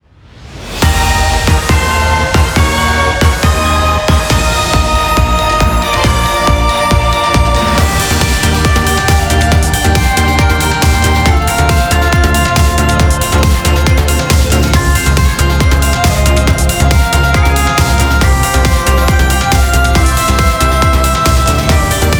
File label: Bgm Bomb; Mahjong Fight Girl (Arcade) (gamerip) (2023)